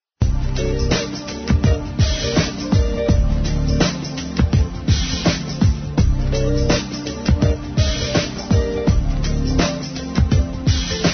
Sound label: jazz pop